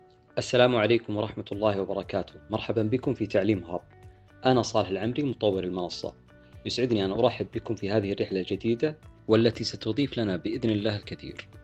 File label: Uplifting Inspiration